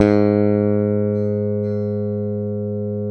SPC SUS F G#2, Bass; Sampleheads; Will Lee Bass Library

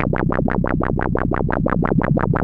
ARP 2600 B1, synthesizer; Hollywood Edge - Giorgio Moroder Rare Synthesizer Collection

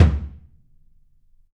DW 16X18, Drums; Big Fish Audio; Ross Garfield Drums VOL-2; ST LOUIS KIT

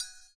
CYM, Ethno Techno; ILIO - Ethno Techno

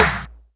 Percussions

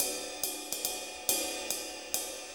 6-8 Ride, HiHat & Ride Loops

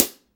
HH CL, Drum 1 Dry; East Collexion

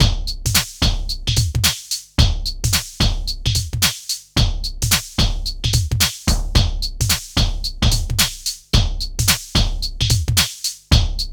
HOUSE, Funky; INIS

